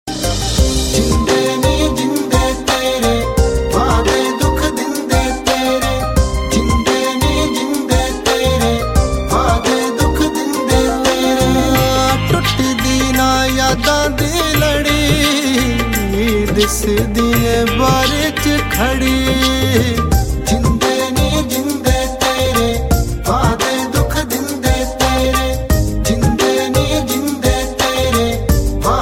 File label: Punjabi sing tone